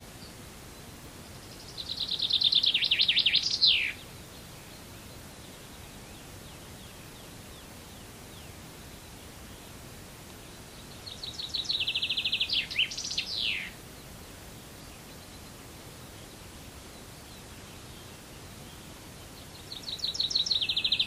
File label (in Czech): Penkava Obecna; poznvme bory